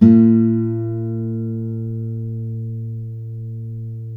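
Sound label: GUILD 12-string, Guitars; Spectrasonics; Hans Zimmer Guitars VOL-1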